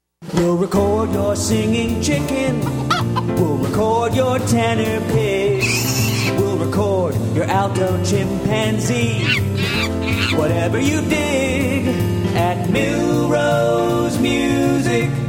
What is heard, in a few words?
Jingle